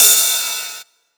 Ride, Cymbals

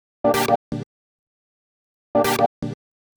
126 G MUSIC; funky; Loopmasters; Funky Underground Vol 2

